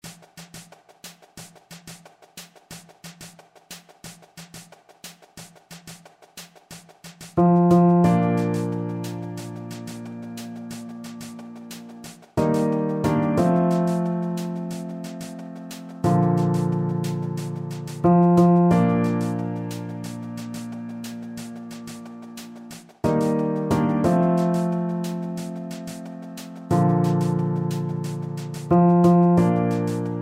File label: Tutti Percu; Siyahamba